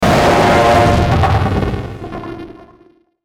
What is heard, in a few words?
Distortion Burst Dark Digital; Blastwave